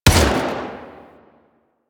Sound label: Burst Rifle Fire; Weapons; Grineer